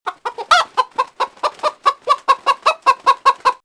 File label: Chicken